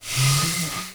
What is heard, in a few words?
clean toilet start; Singles Flirt Up Your Life